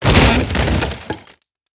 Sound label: wood box mid; World of Tanks Blitz